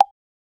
soft rounded tactile tap, bouncy

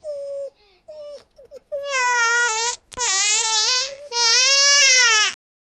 baby crying and screami

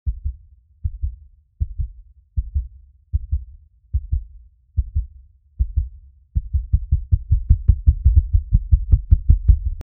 heartbeat or drum roll; rhythmic

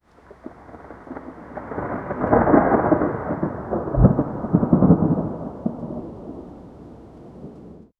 thunder wind surge; weather

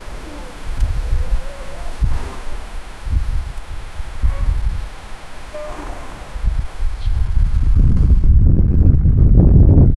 pirate town with occasion

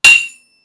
metal

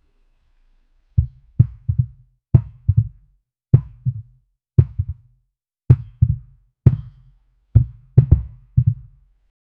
deep hollow bounce of a, basketball

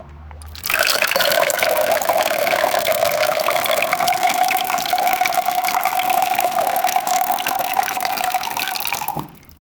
lots of potatoes pouring, produce

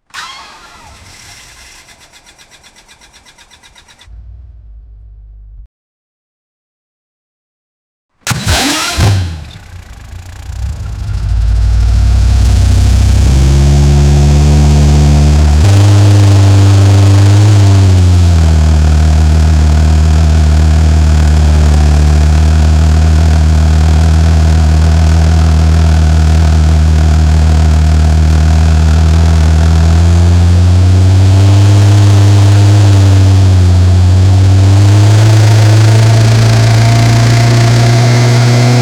ignition takes a little, engine

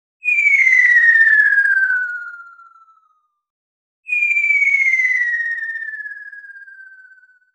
whistle sound effect, signal

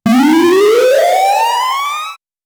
player game jump

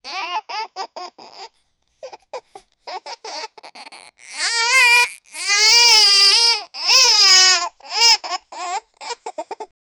baby laughing

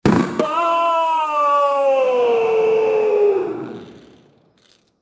body fall

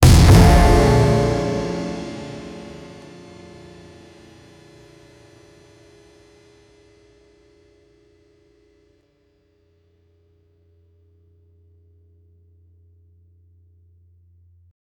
a guitar solo outro, concert

